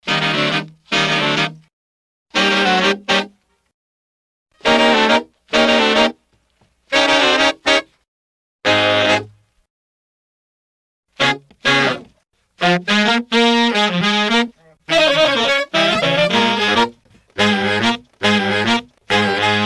brass loop

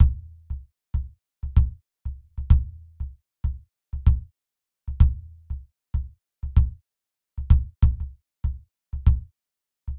Drumbeat - kick; Mixter Plus Drumbeat

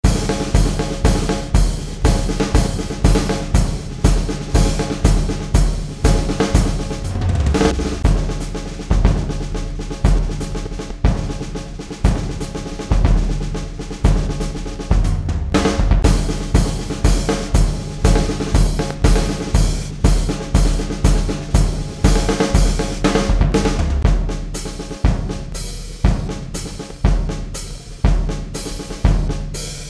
120bpm marching drums; Jamstix

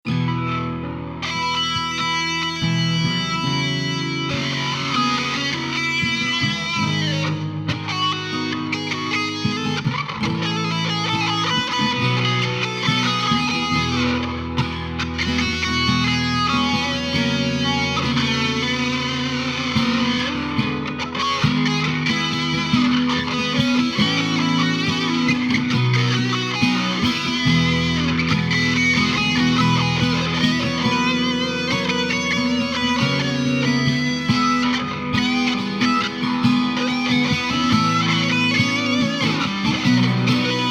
E140 GTR Blue Sky Impro

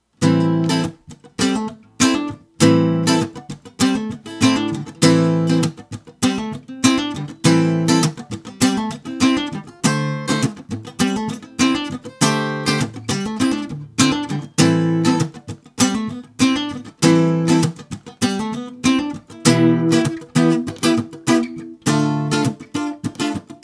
Acoustic 12 bar rhythm in Am

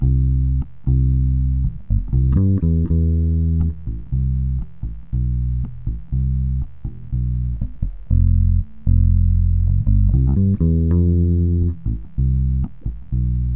It Strums in C (Duet)

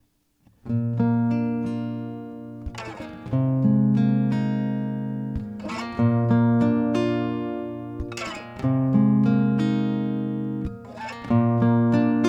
F 90 Old Strings GTR arps dry

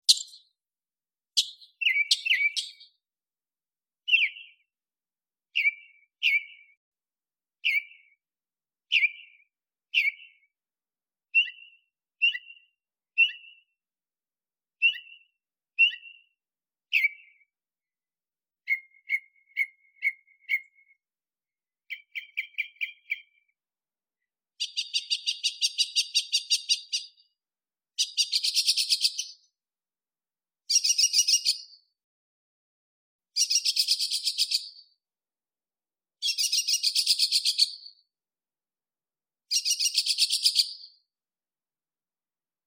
Desert Nightingale Field Recording; MixterPlus; SackJo22

